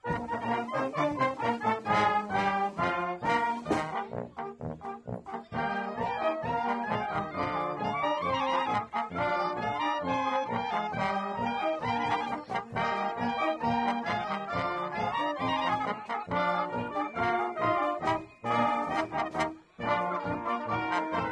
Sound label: village tavern polka, german band; Vernonberg am Potomak Volksmusik Blasskapelle